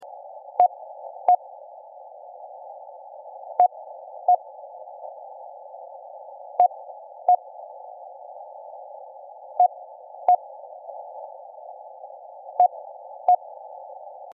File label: pips; Unid pips. Various freqs.